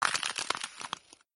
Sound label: ice crack sound; Arc of Templar